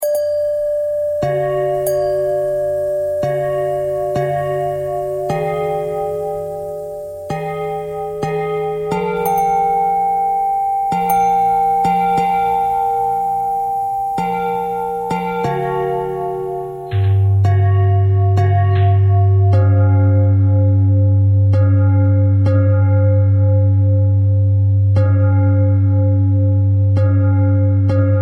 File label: bell thread pentatonic